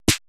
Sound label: PERC MIX; Best Service ProSamples vol.55 - Retro Sampler; AKAI